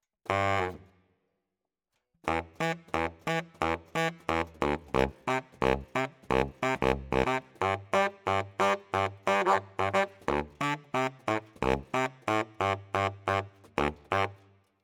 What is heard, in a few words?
Bari, RNR for piano